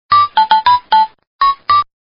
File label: iphone 3g ditty ringtone